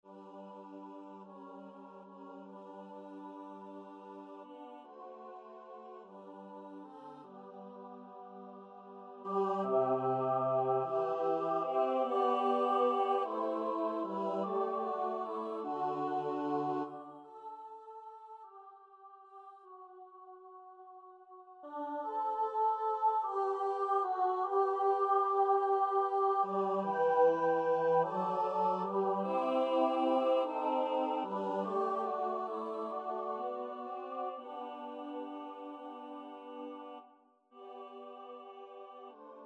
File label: midi; nowowiejski; noc cicha w snie; Biblioteka Utworów Chóralnych przygotowana przez Towarzystwo Muzyczne im. Edwina Kowalika